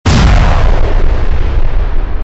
爆炸声

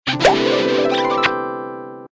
亲吻

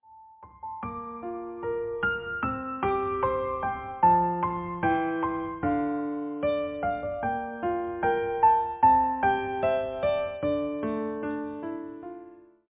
开头引导音乐

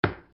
锤子

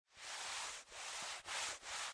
擦东西

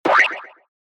失败音

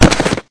人摔倒

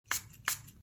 喷药声

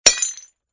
锤子

